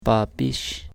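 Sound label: sine wave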